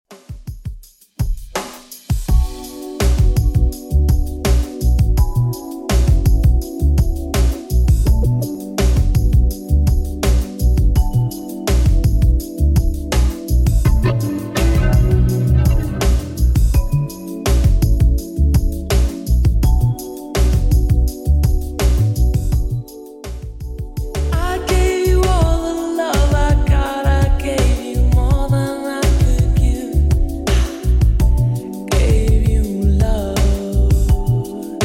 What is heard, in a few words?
90s Redrum 83bpm; remixplanet